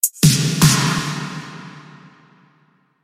escape drums; tf; axel nosferatu

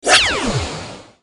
superspeed pickup

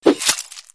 scream kill

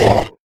kranagull scream; kraak

